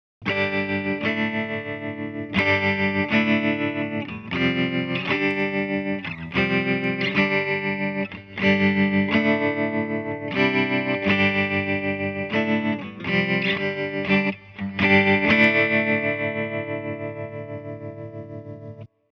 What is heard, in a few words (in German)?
Kanal clean Tremolo